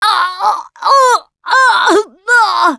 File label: choke, russian, Survivor Voice Producer